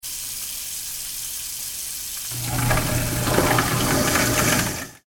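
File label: water and garbage disposal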